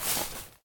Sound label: moss step